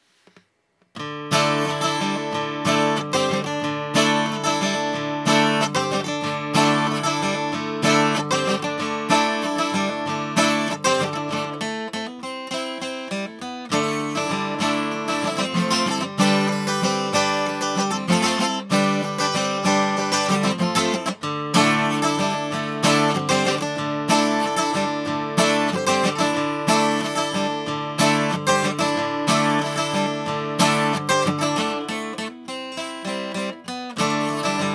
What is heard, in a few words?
Guild D46; acoustic guitar; 1985 Guild D46 acoustic guitar